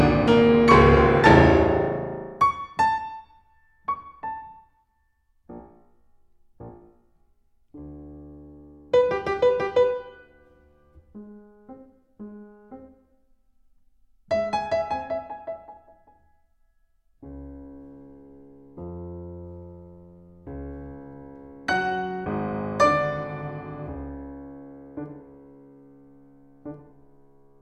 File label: Ballad